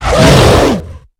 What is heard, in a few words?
hit, enemy combat